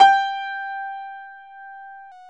PIA HIGH G, Grand

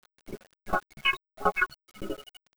GROOVE; RYTHME; USB Soundscan vol.33; AKAI; Disjointed Textures

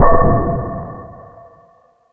Industry, Drum Machines E-MU Pro-Cussion

